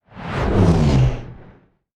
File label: Projectile; Afterbern Democralypse; Windows